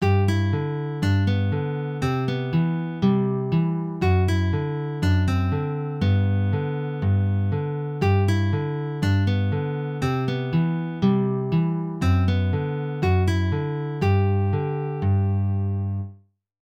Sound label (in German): Staendchen, picking; Fingerpicking; Hörbeispiele Fingerpicking